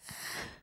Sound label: woman gasp